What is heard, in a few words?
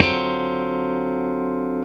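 funk chord, Funk Gtr & Wah; PlatinumPhattCD2; E-MU Producer Series Vol. 8 – Platinum Phatt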